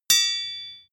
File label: sword hit ring soft